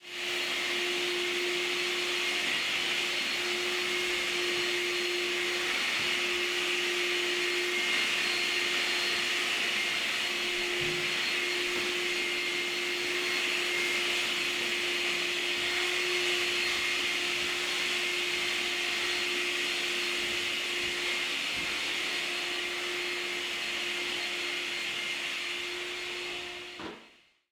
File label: vacuuming; human; NS Studios - ultra sound collection